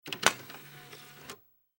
Cd-Rom Disc Tray Open Computer; Technology; Electronics

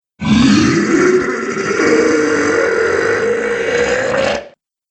Stone Dragon, monster; horror

